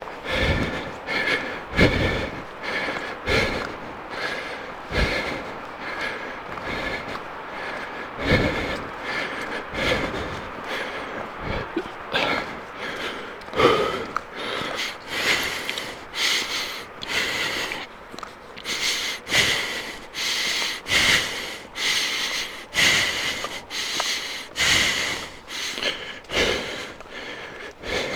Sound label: Breath Nervous